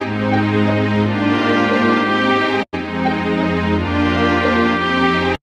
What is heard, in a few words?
Strings